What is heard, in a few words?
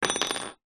household key mortice drop down wood floor